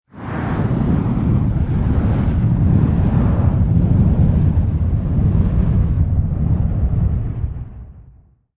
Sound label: Fire Fireball Wave; Flame Sound Effects; Flames (Sound Effects:Objects)